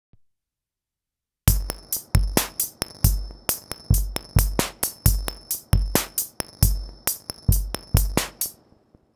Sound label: A melo guitar